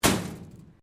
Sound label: METAL SHEET MOVEMENT; Metal (Sound Effects:Materials)